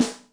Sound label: Snare, Acoustic Snare; Yamaha DTXpress; drum machine; Tosh Sn